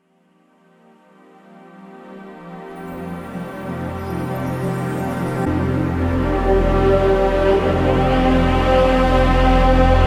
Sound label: Stringtro